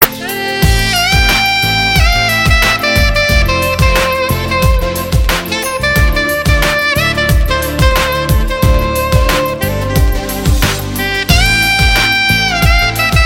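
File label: romantic saxophone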